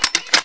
rl feed; cstrike

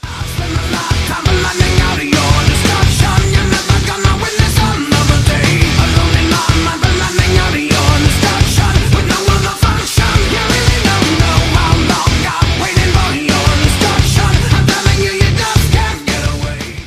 metal; roundsound

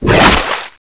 water sphere

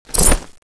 wind knife draw

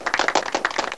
crowd; jailbreak

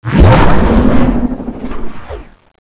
tornado jump

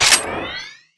lock on gun scope on

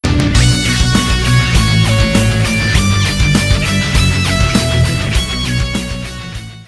survivor win; cstrike; ZP CRISTIAN

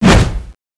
ghost attack

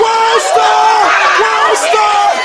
boombox; worldstar; jailbreak

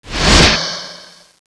ghost spawn

